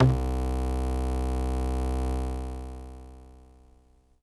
Modular Buzzy Nasal Vinyl Synths C1; vinyl keys

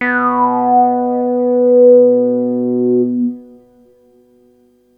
bass B1

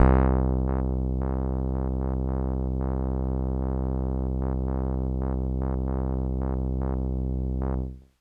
Kawaii C1; keys

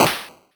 Noise

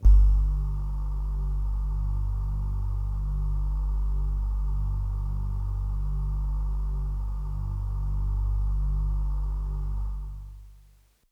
Bell Air Kawaii G-1

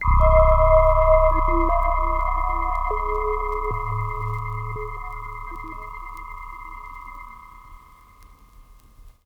DX200 Creep Organ Vinyl Synths C1; vinyl fx

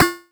Sine Perc

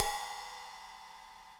Ride Acoustic Crush

